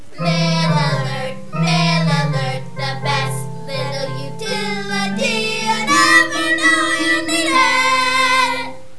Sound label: mail alert jingle